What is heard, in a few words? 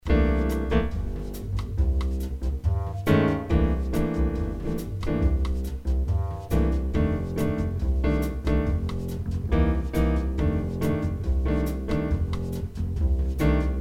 Cycle 5 dominant; Cycle 5 dominant 7th's